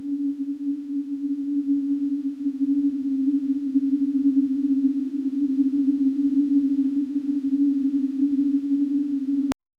windy ambient with magnet, abstract

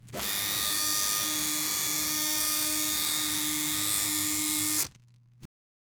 cutting

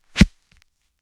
exact mono sfx equipping

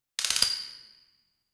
authentic analog 35mm fil, camera

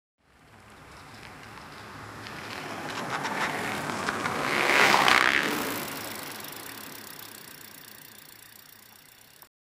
cycle riding on pleasent, bike

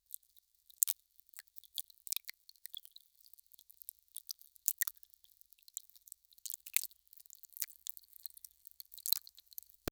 catching fish using a fis

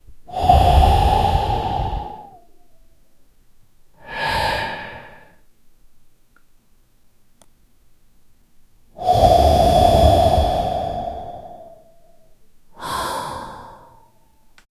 slowly exhale through nose, breathing

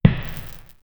fantasy arrow hit

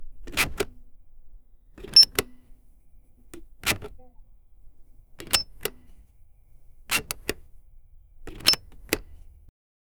wiper blade being clicked; automotive

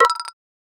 equip chainmail; sfx arpg ui

